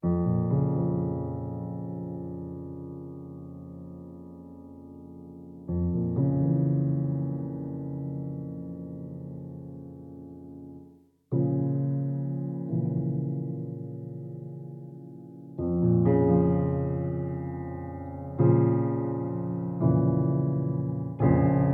Melodic; Inmost

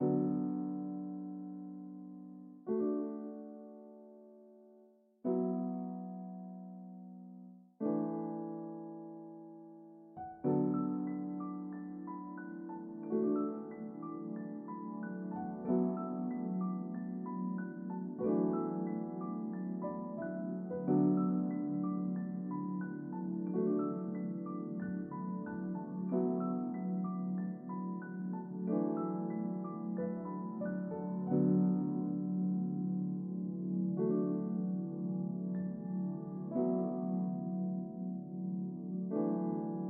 Piano Looped

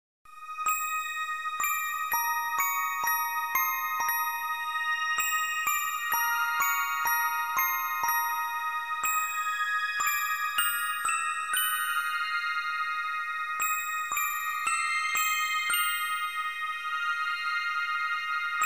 Spell, mt-32; quest for glory 2 trial by fire mt-32 ms-dos gamerip 1990; Ad Avis' Spell

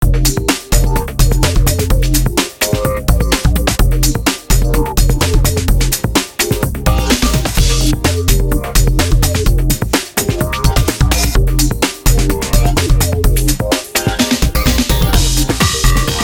Ui Results; Beat n Groovy; xbla xbox 360 gamerip 2008

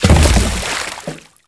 Hit rock

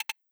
Button Cancel

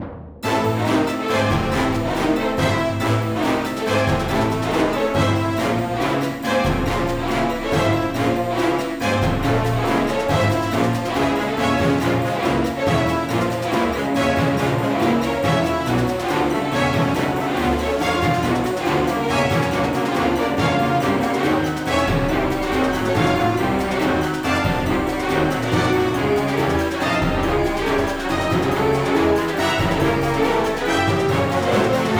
Tension

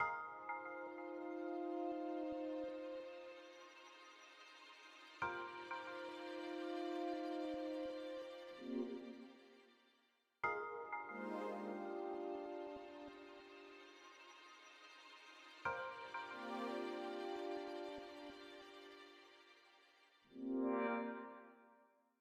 Low Section C; SmallDungeon

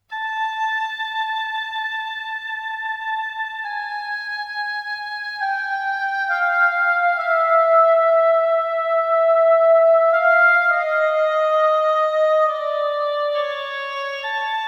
Variation - Passion (Oboe Melody); max payne 2 original soundtrack game rip

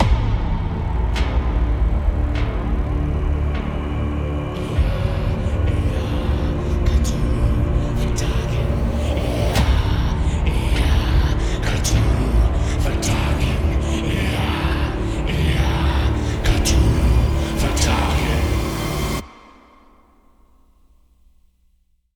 Defeat

